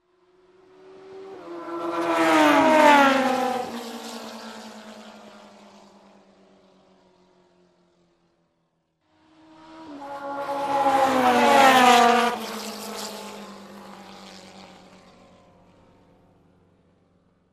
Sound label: Two ring motorcycles pass by; motorcycle racing